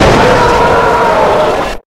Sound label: jumpscare sound; fnaf plushies v3